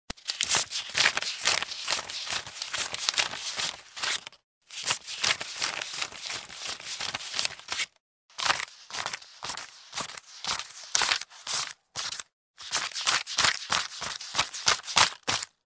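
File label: counting money at the checkout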